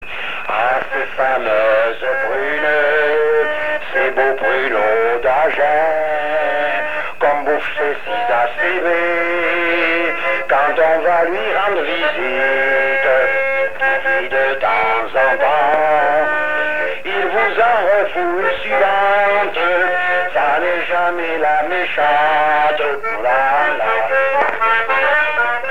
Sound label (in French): chante